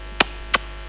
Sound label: kiss; PPT音效